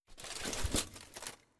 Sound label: Armour; Legend of Keepers (gamerip) (2019)